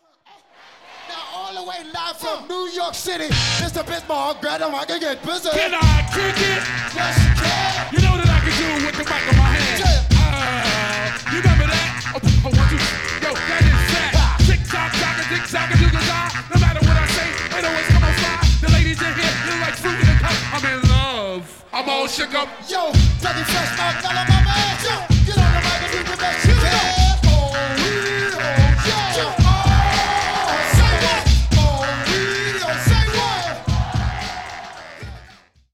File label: funk; multitrax